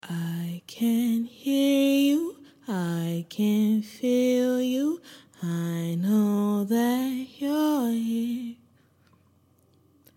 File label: lo-fi 90 Am, Acapellas